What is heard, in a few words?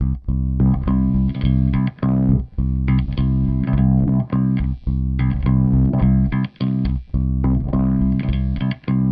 Delay, Bass; Effected; Bass X